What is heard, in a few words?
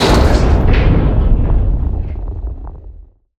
impact metal, Cinematic Dark Sound Effects; BlueZone